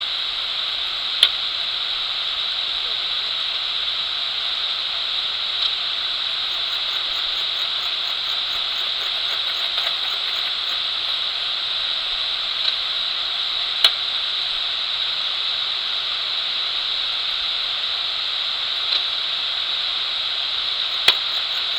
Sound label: poecilimon ornatus, orthoptera; WSchweighofer